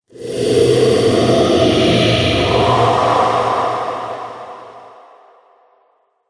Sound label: Pyramid Phantom, ghost sound effects